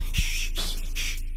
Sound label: whistle